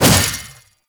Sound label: Mace Whoosh; Warframe; Duviri; Dax; Mace And Shield